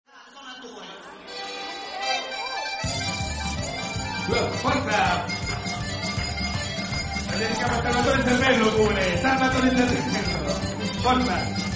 italian folk dance tune

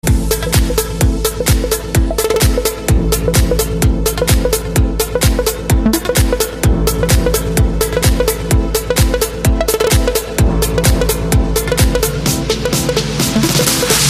edm waves ringtone, electro; electronica; hip hop

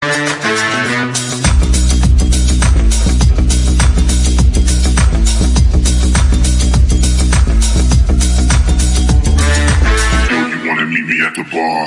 iphone 8 stock ringtone

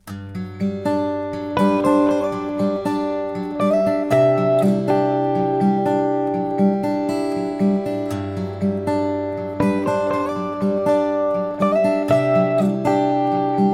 first light ringtone

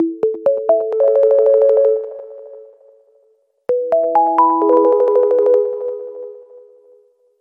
huawei mobile ringtone

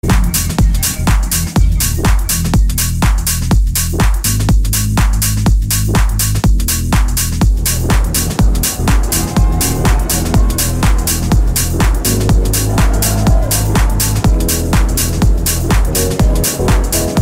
trance ringtone

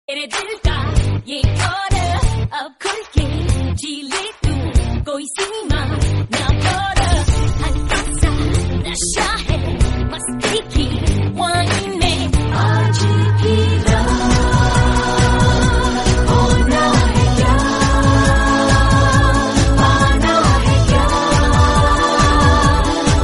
srilankan love tone ringtone

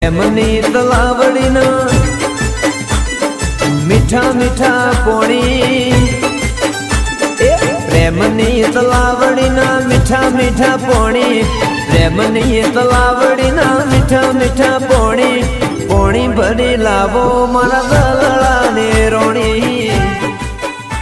gujrati romantic ringtone

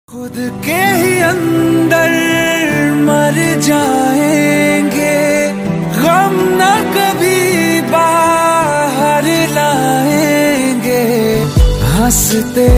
latest hindi song ringtone

